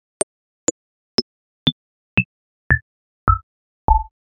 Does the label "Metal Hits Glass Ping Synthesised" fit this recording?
Yes